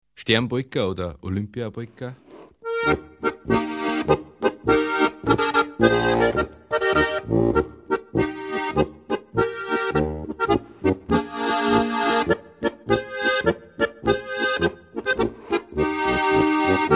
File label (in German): polka